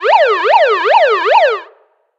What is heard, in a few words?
alarm Police Siren; SnootGame